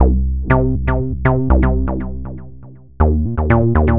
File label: Pro Bass, Bass Foundations; Sonic Foundry (Sony Creative Software); The Electronic Music Manuscript Collection